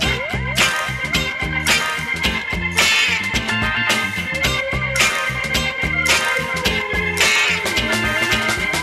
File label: Music